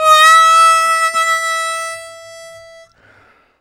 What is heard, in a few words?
Harm 098bpm A end, Harmonica; Quazibeat - African Secret